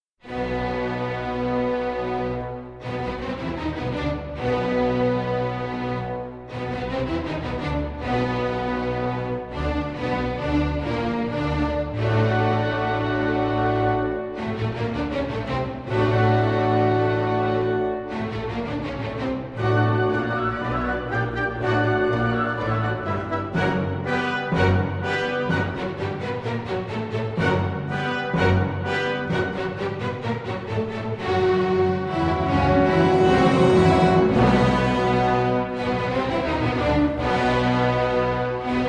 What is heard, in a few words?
Symphony in B Minor, Allegro